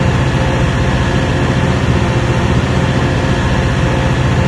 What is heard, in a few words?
ambience; svencoop